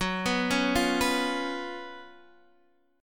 F sharp Major 7th Suspended 4th F sharp, Guitar Half Step Down